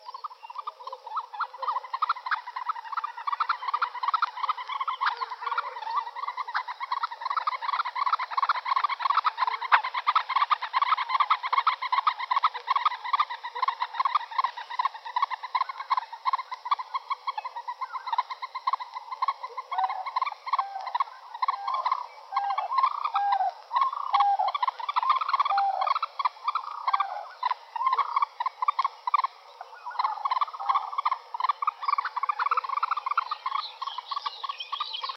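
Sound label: red-necked phalarope voice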